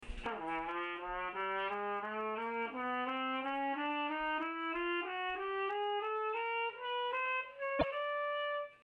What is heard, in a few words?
chromatic